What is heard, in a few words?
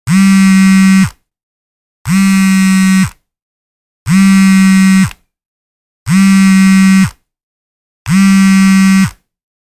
vibration telephone